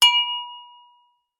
household glasses wine x2 clink; sound effects one

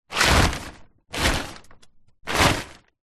tarpaulin sheet large 3x short movements; foley

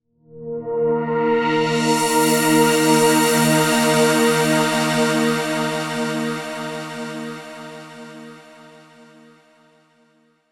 multimedia musical branding signature logo sweep

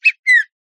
bird cockatiel chirp; animals

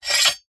warfare knife blade draw

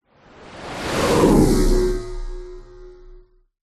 whoosh element pass by machine vehicle; science fiction

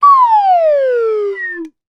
cartoon slide whistle descend

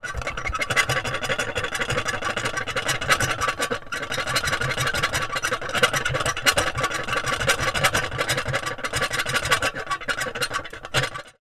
foley metal lose light rattle squeak handle

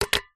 stapler plastic set down on table

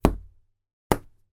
wood hardboard thin light tap knock x2; foley

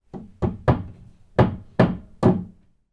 industrial hammer nail into wall next door

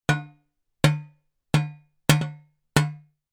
impact metal tray med object strike blunt x5

